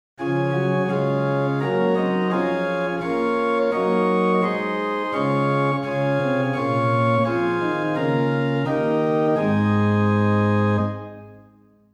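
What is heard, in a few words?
Principal 8 + Octava 4, organ; Reinhardtsgrimma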